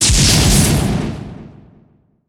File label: warcry; sven